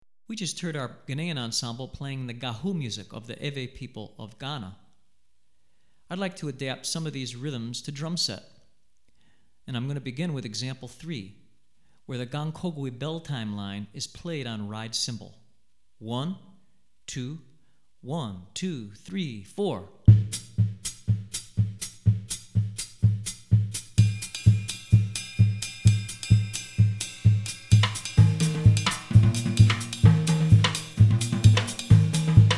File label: Drumset, West African Rhythms For Drumset; Gahu; Hartigan